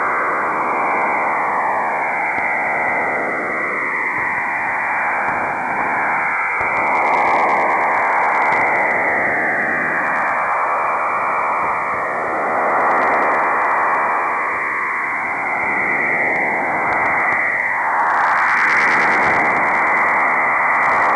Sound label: harp1 7407khz